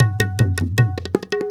INDIA R, IND ENS PH, Heart of Asia; Spectrasonics - Heart of Asia CD2

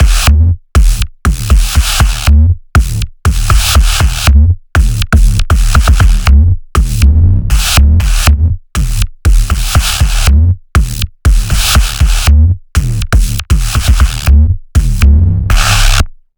KOAN ISH bass Dm

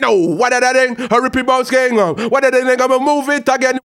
HAL RAGGAM, RAGGA-MUFF A maj

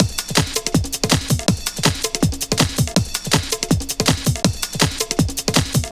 Talk Quick, breaks; Jungle; Jungle Warfare 1&2

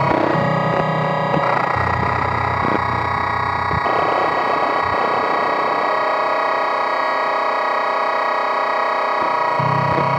Cracked Tone; Sonic Foundry (Sony Creative Software); Loop Noir

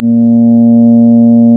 Synclav FM, WIND, A#; Big Fish Audio

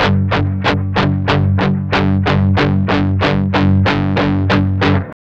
MED ROCK 8TH G#2, Guitars; Spectrasonics; Hans Zimmer Guitars VOL-1; 102-MED ROCK

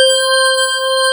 C5, Synth, BASIC WF

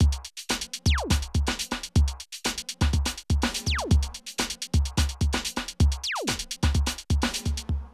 Drum loops, Rave & Hardcore

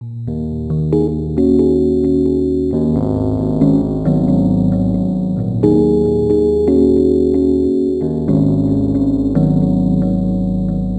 Rhodes Attacks; Ueberschall

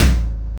LO TOM, Ensoniq ZR-76; 300 Drum Machines

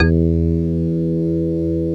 METAL B

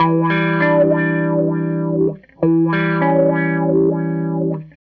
SG Guitar Loop

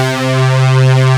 JUMP; East West; Global Sounds

